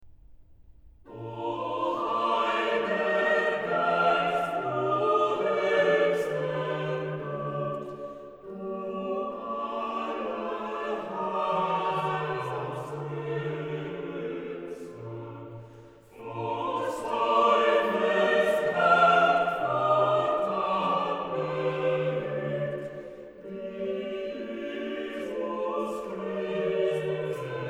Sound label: Chorale, organ; BWV0260; O heil'ger Geist, du hochstes Gut; BACH; BACH ORGAN WORKS; CHORALE PRELUDES